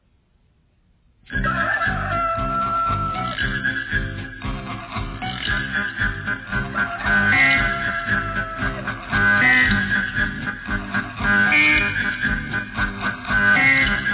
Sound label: Instrumental